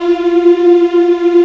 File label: VOX African Mm; Roland LCDP11 Africa VOL-1